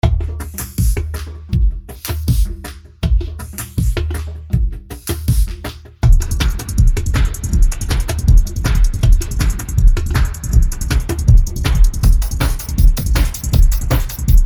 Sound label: Drums and Percussion